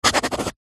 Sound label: pen rollerball sign signature paper pad; office